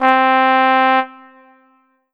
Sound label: Ensoniq ZR-76 Trombone C4